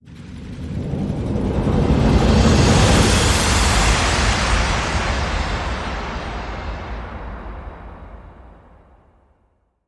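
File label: Crescendo Down The Hill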